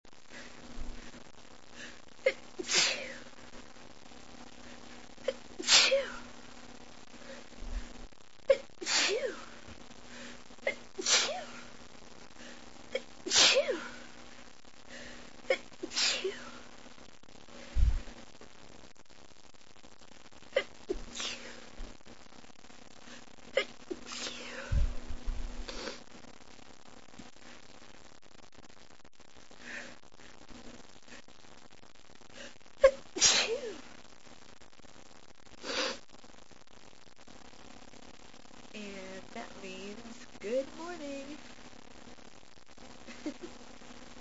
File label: am sneezes, female